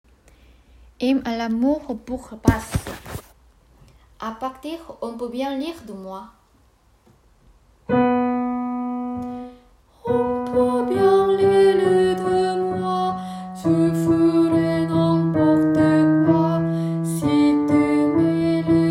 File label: hymne a basse